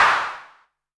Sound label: clap, Novation Bass Station 2